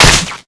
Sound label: elec spark